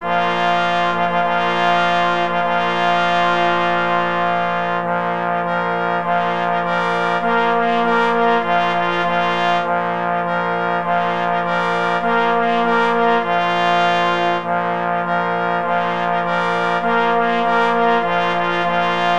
Fanfara, na 3 plesy i 2 parforsy; B. Kopera; Fanfara Klubu Jamnika PZL; pies w muzyce lowieckiej dodatek

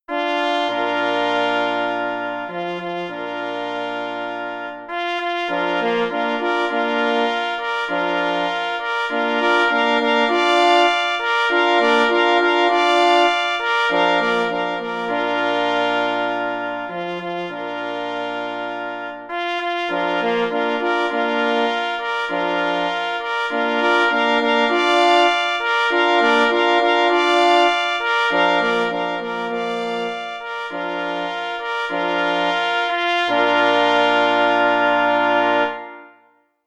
Hejnal na 2 plesy i 2 parforsy; Plockich Mysliwych; Dr Marcin Kamiński (1913-1990)

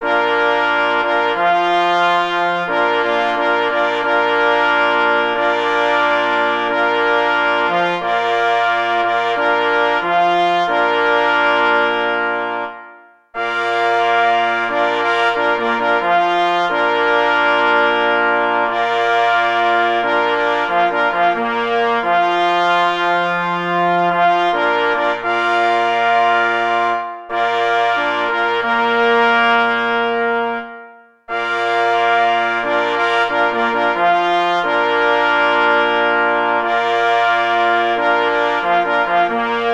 na 4 plessy i 2 par force; Cz. Trybus; Ostatnie dzwieki

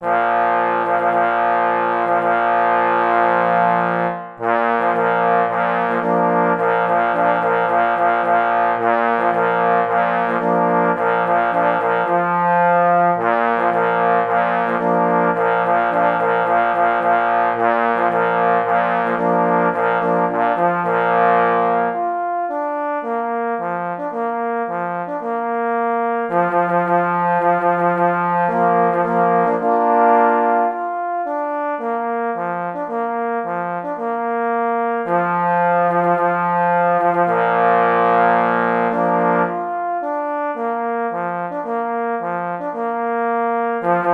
Fanfara na 3 parforsy; B. Kopera; Fanfara Klubu Jamnika PZL; pies w muzyce lowieckiej

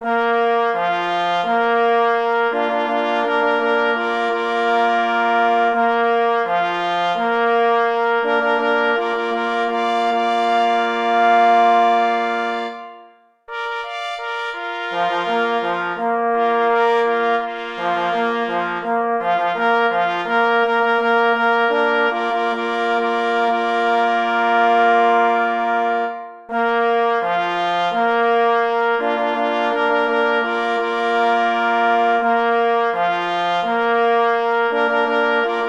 Fanfara na 2 plesy i 2 parforsy; Fanfara na czesc psow; pies w muzyce lowieckiej dodatek

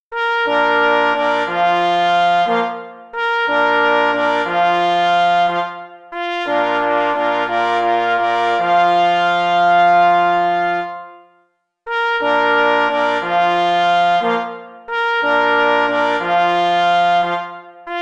fanfara na 2 plesy i 2 parforsy; Bachusik z Sokolem

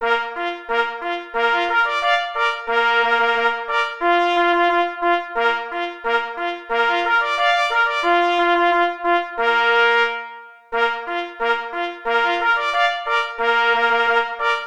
Marsz; J. Rosner

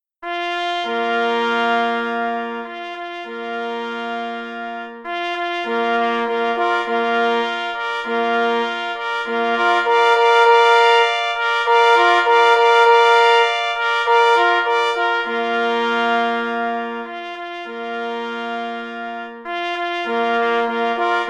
Hejnal na 3 plesy; Plockich Mysliwych; Dr Marcin Kamiński (1913-1990)

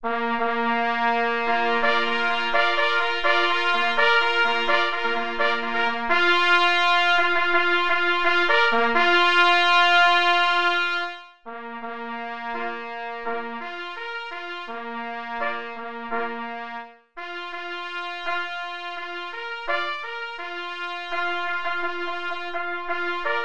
Fanfara dla Zubra na 4 plesy; A. Kanawka; Artur Kanawka (ur. 1970) – waltornista, dyrygent chóralny i orkiestrowy, kompozytor

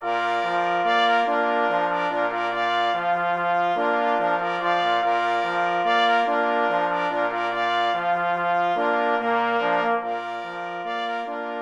Walc na 2 plesy i 2 parforsy; K. Anbild; Walc pszczynski

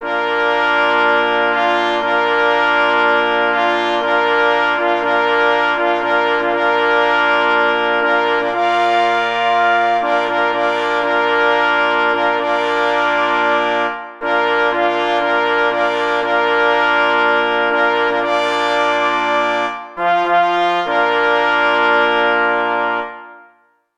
hejnal bydgoskich sygnalistow na 4 plesy i 2 parforsy; Cz. Trybus; wersja oryginalna

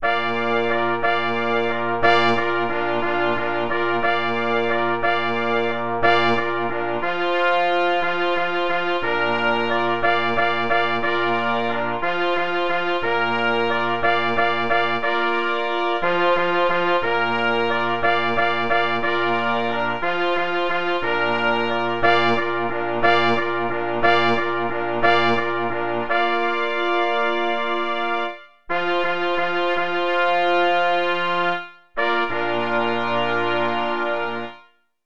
Capstrzyk mysliwski na 2 plesy i 2 parforsy

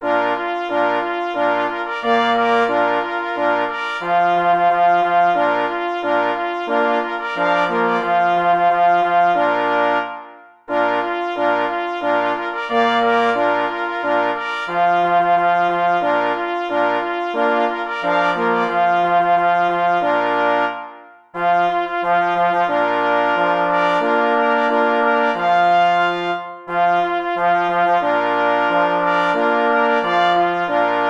Marsz na 2 plesy i 2 parforsy; J. Rosner

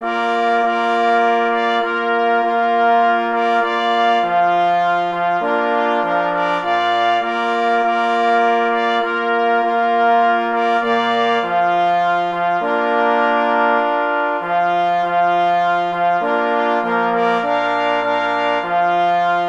Fanfara kynologiczna na 2 plesy i 2 parforsy; pies w muzyce lowieckiej dodatek

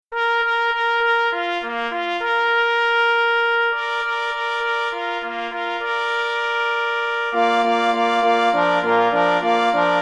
na 2 plesy i 2 parforsy; K. Kryk; Chwala odznaczonym

